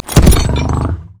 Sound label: emote dance; LoL; sejuani base